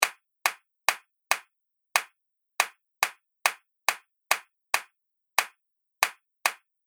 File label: Hand Clap Groove Loop; Percussive And Rhythmic; Seamless loop